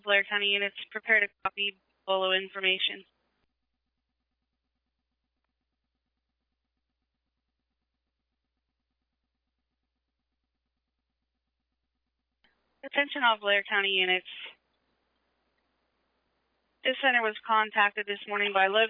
alert tones page received

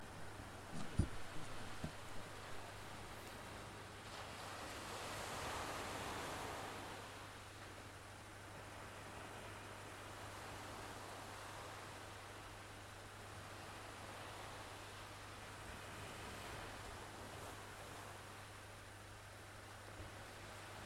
welsh waves on the beautiful beach at aberystwyth; in the early hours of saturday

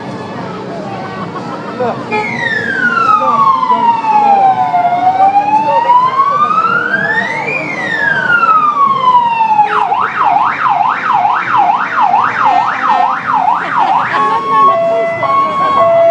lord mayors procession

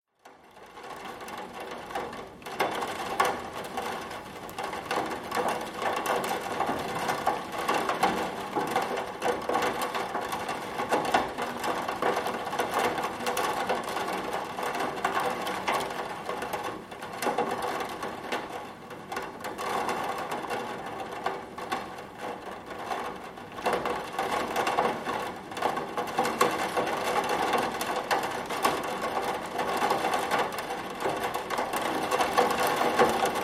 sounds of rainfall in the Krakow cityscape

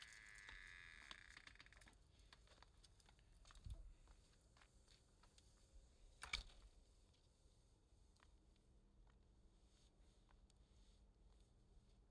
soundscape

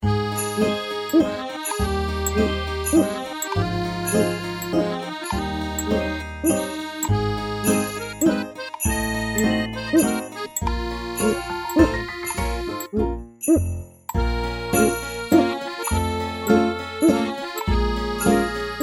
4/4 rock piece

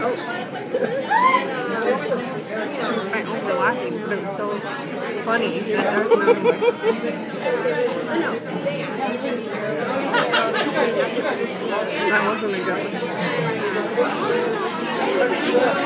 most annoying laugh

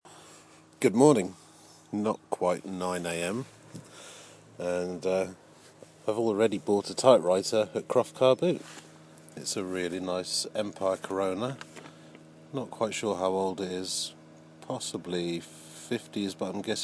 car booing

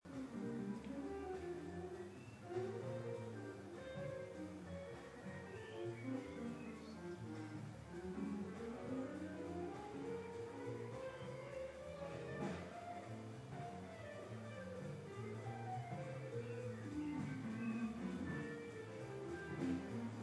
live suburban jazz from our neighbours

